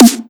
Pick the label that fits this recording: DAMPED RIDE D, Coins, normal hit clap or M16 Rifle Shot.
normal hit clap